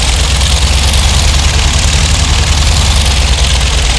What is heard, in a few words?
plane engine; overlord